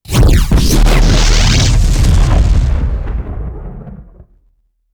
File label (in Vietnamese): Âm thanh di chuyển trong không gian viễn tưởng